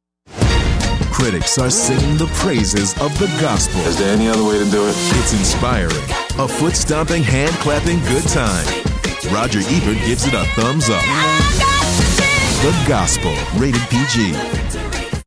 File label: gospel